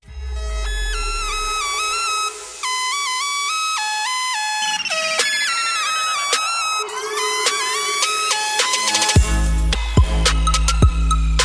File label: Gaming Ringtone; San Andreas